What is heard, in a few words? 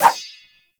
Rapier Whoosh; Swords